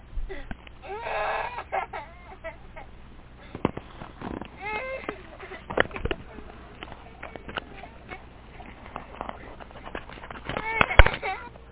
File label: 3 Year Old Crying